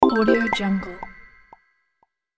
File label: level up pop